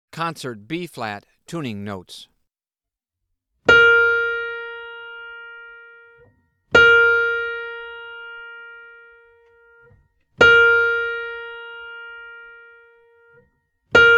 Tuning Notes; James „Jamey“ Aebersold Play-Alongs

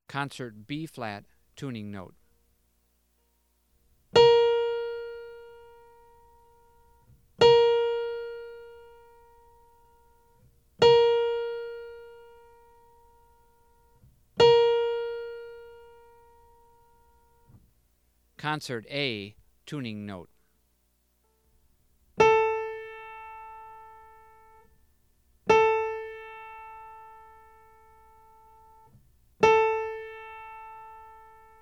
Tuning Notes; James „Jamey“ Aebersold Play-Alongs

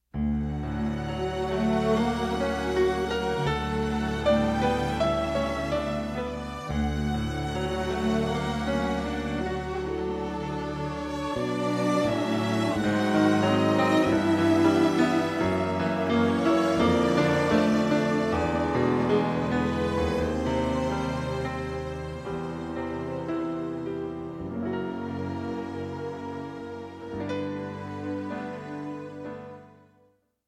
Theme Rachmaninoff Piano Concerto 2; Der professionelle Arrangeur

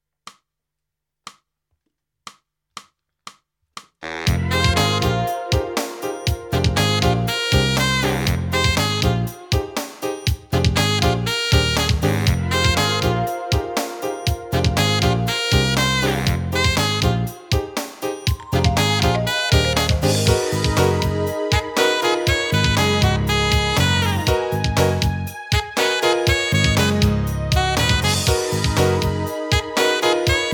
Minor Song Melodie, Saxophonimprovisation

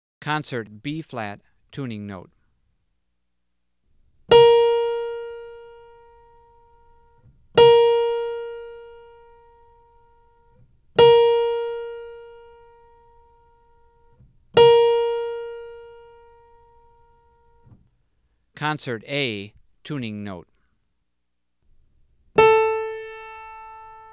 Tuning Notes; James „Jamey“ Aebersold Play-Alongs

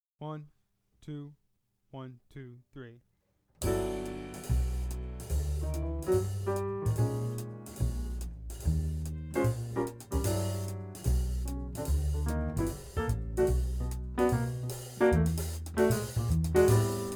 Play-Along, Saxophone; Jazz Conception For Saxophone; 21 Solo Etudes (Eb Saxo)

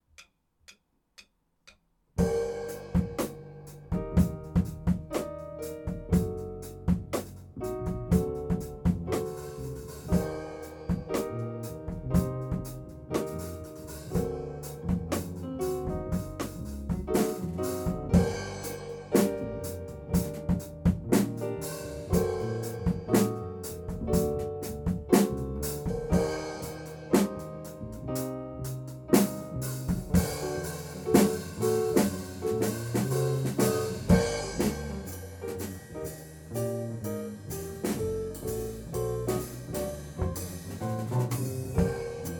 Karaoke Solo; Probe; Trolls Abgang

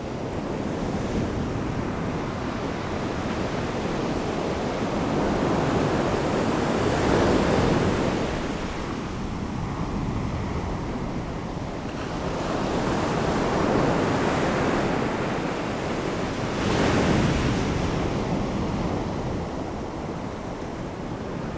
Nature Sounds Ocean Waves

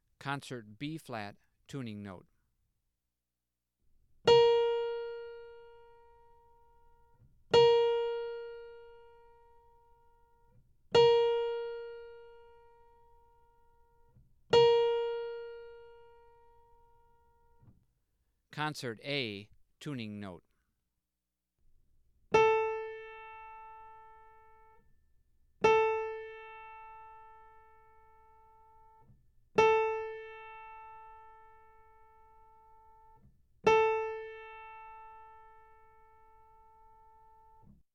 Tuning Notes; James „Jamey“ Aebersold Play-Alongs

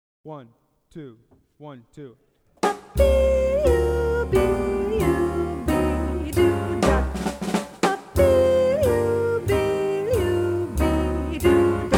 Saxophone; Jazz Conception For Saxophone; 21 Solo Etudes (Eb Saxo)

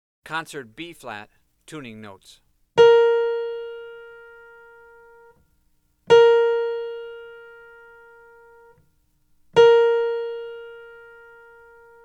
Tuning Notes; James „Jamey“ Aebersold Play-Alongs

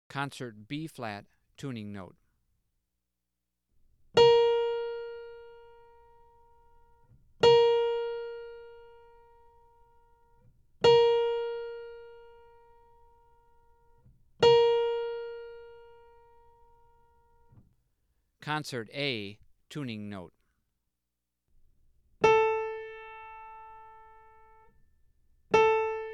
Tuning Notes; James „Jamey“ Aebersold Play-Alongs

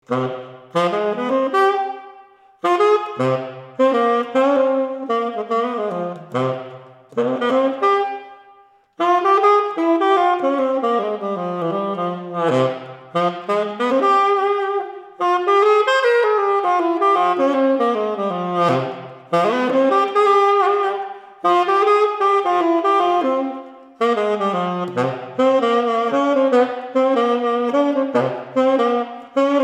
Improvisation; Nils Thoma Eigenaufnahmen (Reha-Klinik, Bad Schandau)

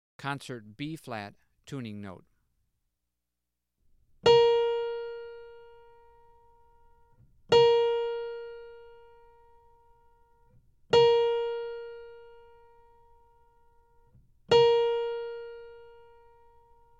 Tuning Notes; James „Jamey“ Aebersold Play-Alongs

